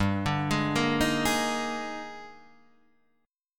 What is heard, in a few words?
D sharp Major 11th, G, guitar chord